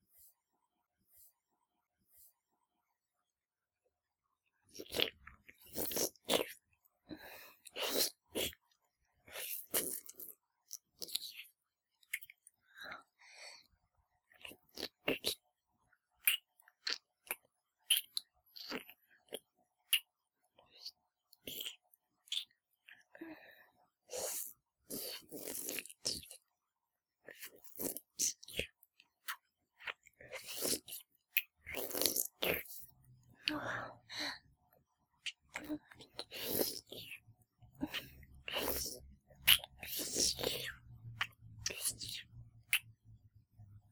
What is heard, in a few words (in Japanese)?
ディープキスオンリー, ASMR